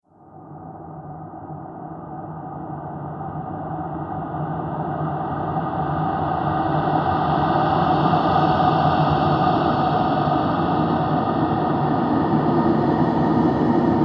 Sinister Breaths Horror, Scary Breathing

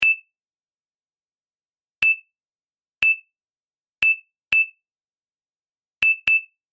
Scanner Beeps Barcode Reader; Beep Sound Effects

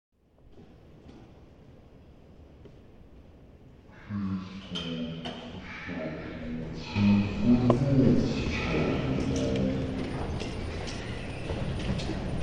Airport Terminal Shuttle Train; Tom Hutchings

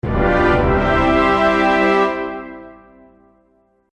Epic Orchestral Ending; Musical

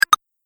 Pickup App Game; Video Game Sound Effects

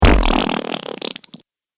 Mud Splat; Slimy Sound Effects; sound design sound effects

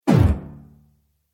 Excited Impact, impact sound effect; Excited Impact - Slow Echoes

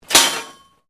METAL PIPE IMPACT; Metal Sound Effects; Metal (Sound Effects:Materials)

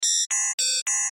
Cartoon Sci Fi Robot Bleeps Computing Loop Sci-Fi; Cartoon Sound Design; Blastwave

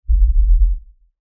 LFE Ultra LFE Hit, Lfe Ultra Low Bass Hit Impact; Deep Tone; Sound Design

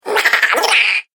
Horror Gremlin, monster

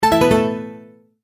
Multimedia Tone-Return/Back; Tones; Sound Design; McKinney Sound

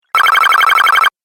Retro Videogame Fx Coin Collect

